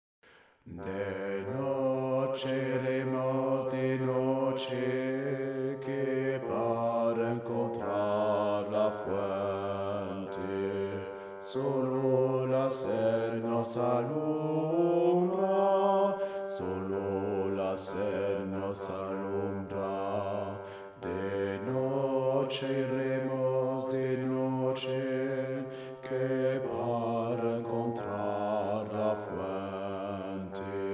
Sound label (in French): BASSE